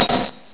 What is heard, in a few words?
hammer